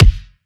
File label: kick paper, Drum One Shots; SD MIDNIGHT Sample Pack; Slate